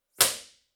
impulse response; Corso acustica

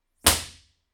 impulse response; Corso acustica

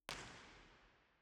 First IR Sala 2800 Genelec Left BIN, Binaural; Santa Cecilia Roma; Measurements 2005; Soundfield

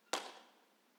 IR clappatore; Teatro Benevento; B-format

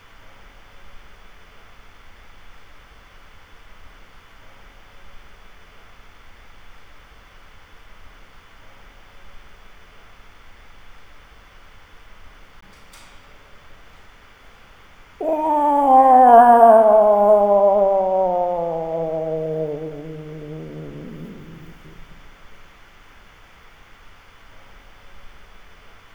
Aircraft Passby

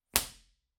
impulse response; Corso acustica

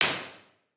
curtain closed

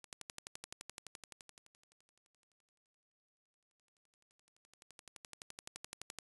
Turntable; Rode

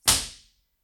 impulse response; Corso acustica

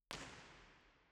First IR Sala 2800 Genelec Right BIN, Binaural; Santa Cecilia Roma; Measurements 2005; Soundfield

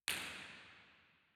Auditorium, Theatres

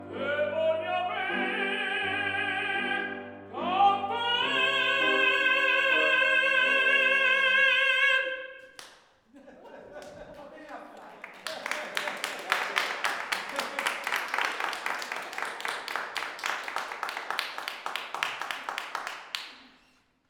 Corale; Verdi; Neumann

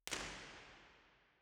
First IR Sala 2800 Genelec Right WY, Soundfield; Santa Cecilia Roma; Measurements 2005

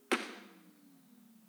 IR sweep; Teatro Benevento

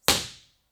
IR

